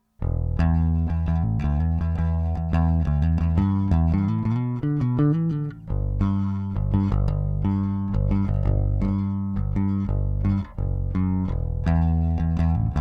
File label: Bass, Preamp; Avalon U5